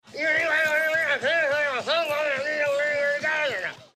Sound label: mumble